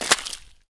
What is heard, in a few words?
ice footstep; metrocraft